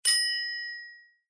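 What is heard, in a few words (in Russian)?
идея пришла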